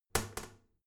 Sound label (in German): toilet lid wall open regular, badezimmer